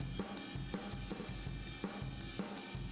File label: Boogaloo Chorus Room M, 82 BPM